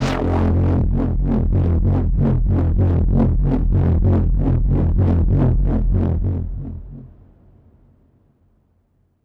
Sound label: Flytrap Overlay Dark